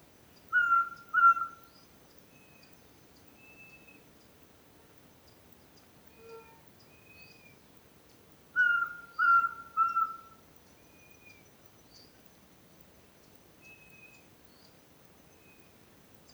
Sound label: Glaucidium minutissimum, Kleinste dwerguil; uilen; Strigidae